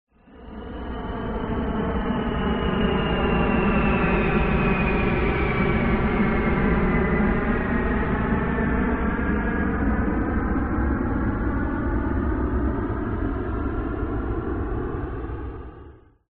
TRANSPORTATION JET MILITARY B-52 Stratofortress Flyby Distant